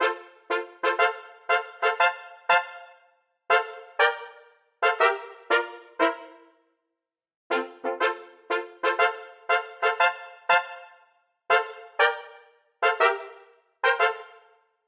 Ostinato Royal Entrance I ii V I ii V I F Major 60 bpm, Brass Quartet; MIDI Clips; Ableton; Spitfire Audio